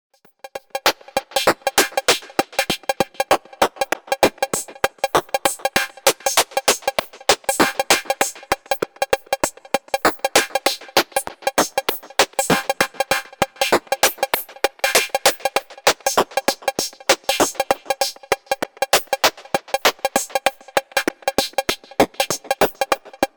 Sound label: Drums